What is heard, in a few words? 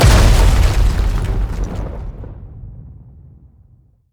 dragons descent land; LoL; shyvana